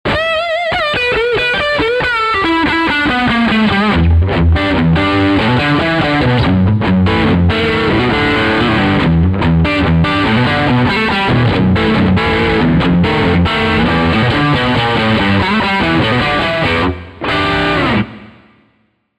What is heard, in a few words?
Fender Strat Marshall JVM410H Wall Of Sound; Two Notes Torpedo Reload